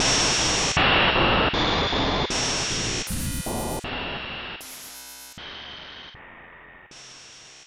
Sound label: Counter PSI; mother2